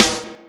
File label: snare, drums; virtual drum sounds; custom drums